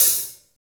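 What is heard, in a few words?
hi hat; Best Service Gigapack 2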